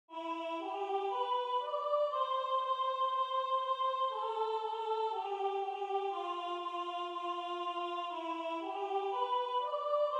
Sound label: alto; Benediction